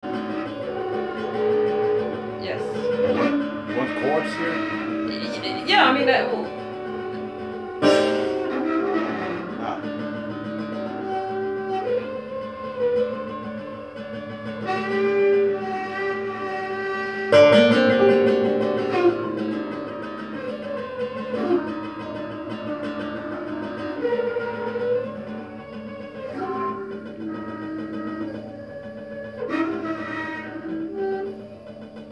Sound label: Chords friction bass; pyykkipoika